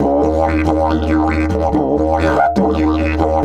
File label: 140, DIDGE RIFFS; Big Fish Audio - Didgeridoo and Other Primitive Instruments